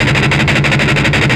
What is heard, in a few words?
Lick, Industrial Nasty